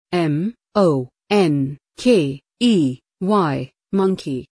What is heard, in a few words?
monkey